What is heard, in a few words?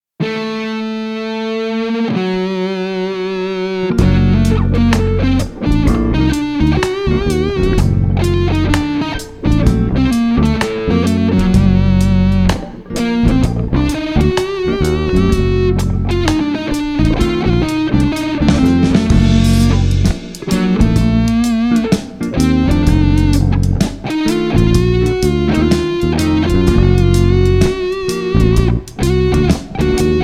lydian augmented